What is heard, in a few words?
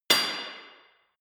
iron small, hits impacts; whooshes rises